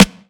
full snare one shot g key, snare sample tuned to the G note; snare drum samples